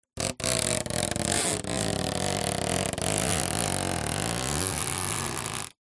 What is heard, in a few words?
plastic tape stretched rub creak squeak; foley; zapsplat